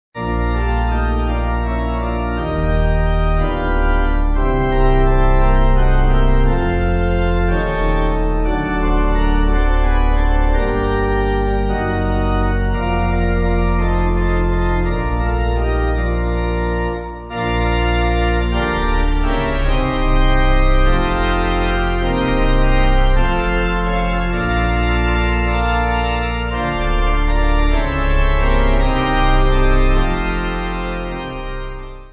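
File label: Pipe, hymn backing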